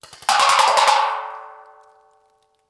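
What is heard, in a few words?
Paintball Gun Impact; Sound Ideas; Guns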